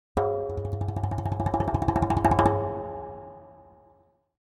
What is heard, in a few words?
Drums Percussion Danger - Fast Drumming On A Thin Metal Percussion; Hollywood Edge; sound designer's toolkit v2